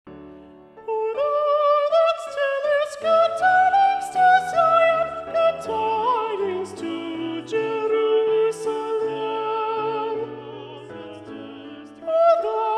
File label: Soprano Predominant; O Thou That Tellest; Messiah; Handel